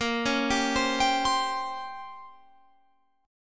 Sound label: A sharp Minor 6th Add 9th A sharp down Guitar Open F, chord; A#m6add9; arpeggiated